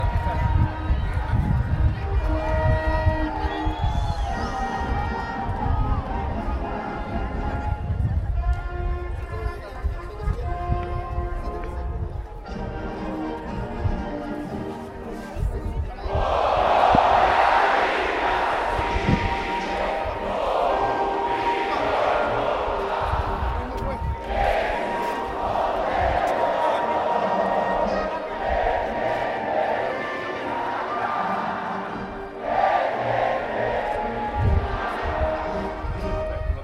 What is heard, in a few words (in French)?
Hymne Colombie